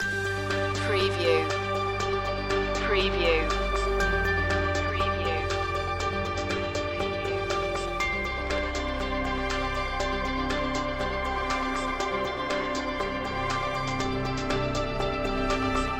loop

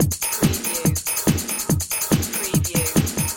Techno-Trance Drum Loop 142 BPM

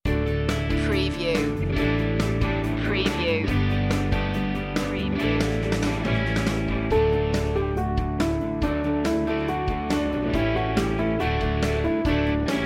deck the halls pop

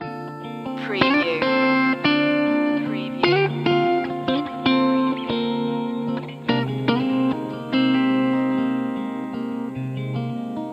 guitar stories loop

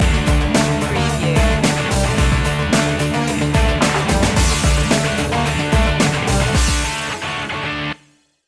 bang boom stinger

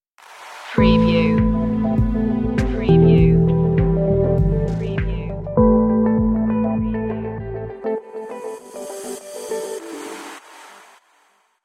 groovy meditation stinger

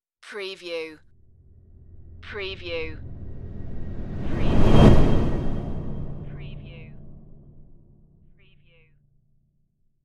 scary whooshes

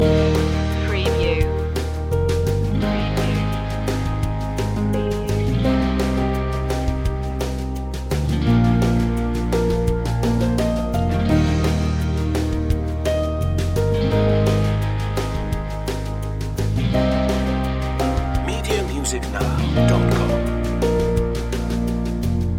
lovely landscapes loop

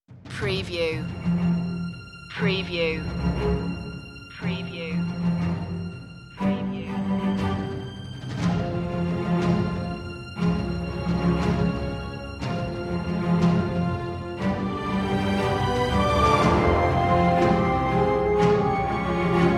no choir version

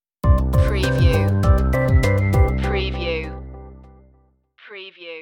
scientific discovery stinger

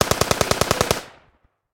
Machine Gun AK-47

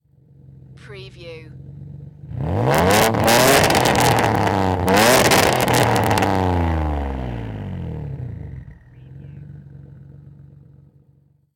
NISSAN 350Z EXHAUST DOUBLE REV

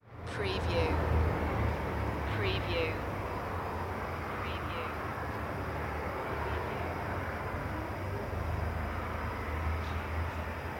Ethiopia Suburb; sound-effects-bible

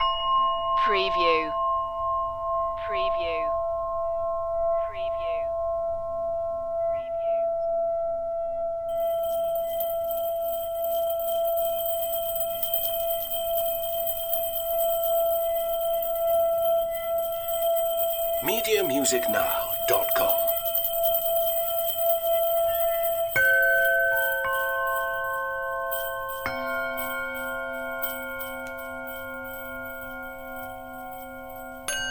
tibetan bowls meditation loop

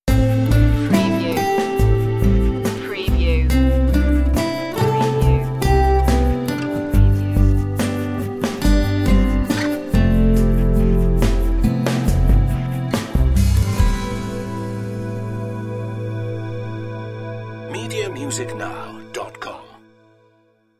mellow guitars stinger

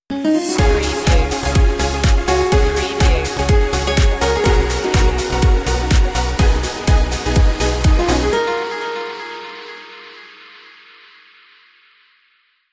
ibiza feeling stinger

